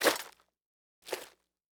Horror Scary Violence Autopsy Knife Cut Body

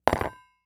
Fork Drop; household

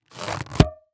Cartoon Balloon Release Rubber Snap; AdobeCC

